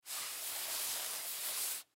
Towel Wipe Stain, Towel Wipe Stain Circular Motion; Bathroom; Household; Blastwave